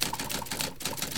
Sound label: type